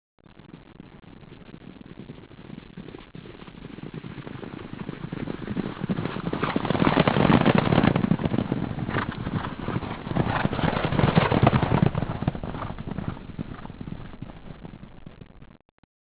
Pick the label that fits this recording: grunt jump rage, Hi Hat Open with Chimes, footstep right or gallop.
gallop